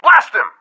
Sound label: blast; star wars; mobs monster